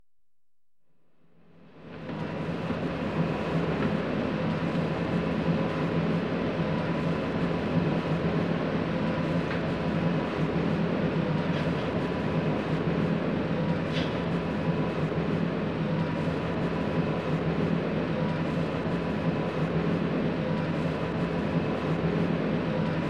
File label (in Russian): zvuk elektrodvigatelja na podvodnoj lodke